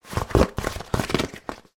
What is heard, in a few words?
rolled cardboard sheet being unrolled; foley